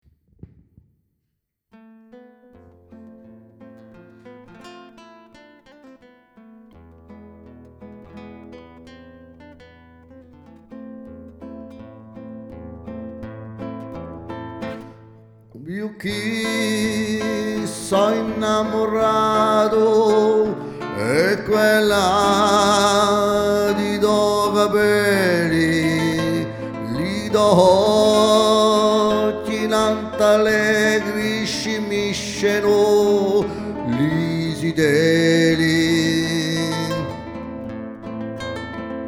BASSE; repertoire de a manna

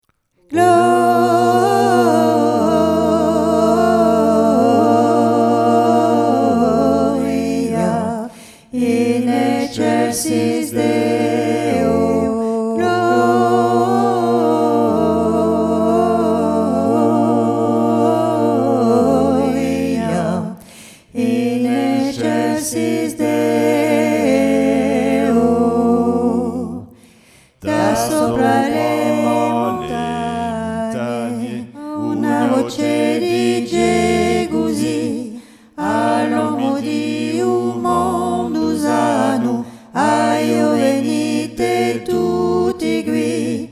GLORIA 3 VOIX

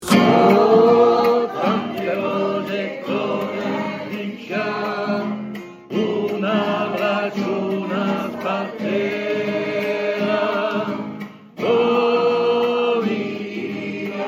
Basses, Cori refrain; u fiuminale di u Ghjuvaghju

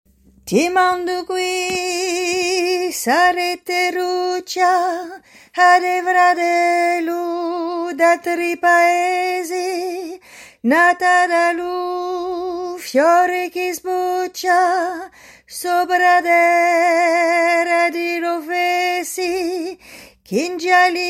Tierce couplet

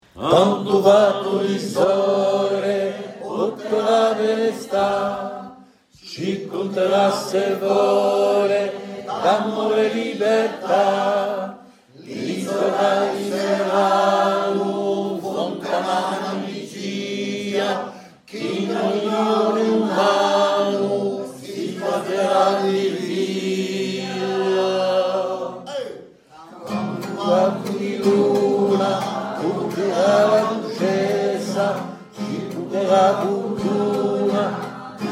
Basses

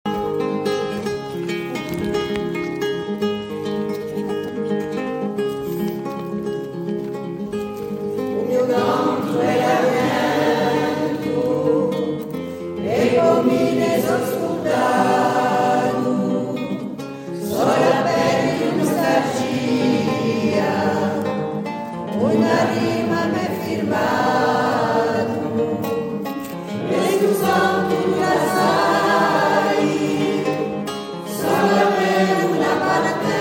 3 voix, u mio cantu

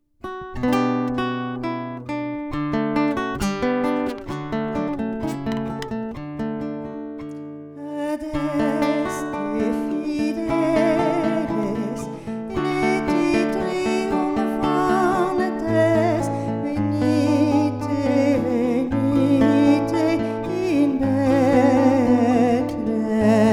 ADESTE FIDELIS 3 VOIX; repertoire de a manna

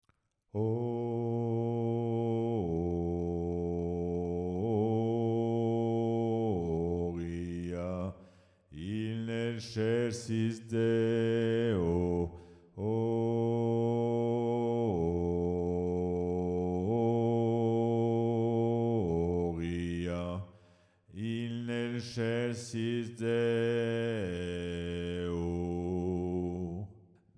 GLORIA BASSE

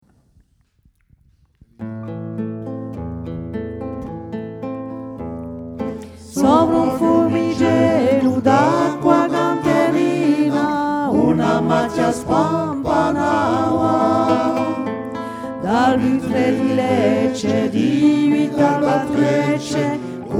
3 VOIX; repertoire de a manna

